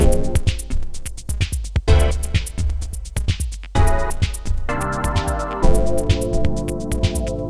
808 Loop With Old School House Like Stab Chord

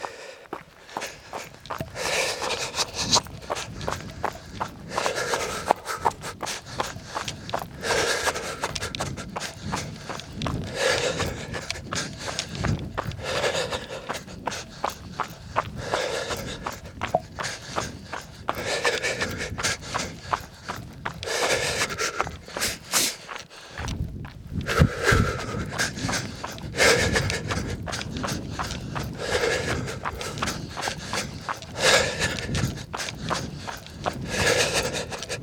Heavy Breathing While Running

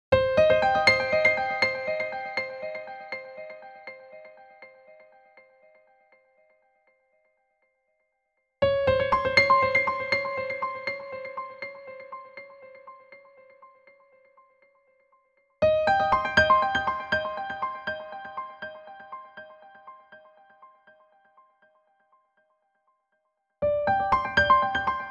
Piano C

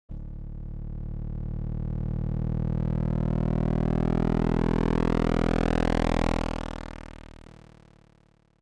Moto

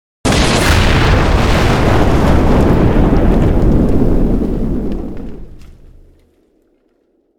Huge Explosion

